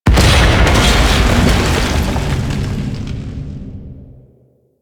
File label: Machine Destroy Rock Fall A; Warframe; The New War; Narmer Outpost